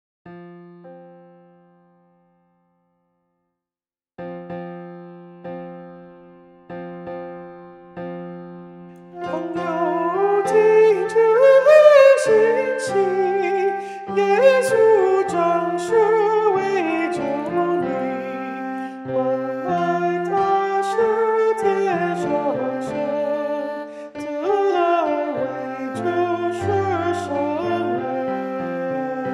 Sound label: Pengyou Ting Soprano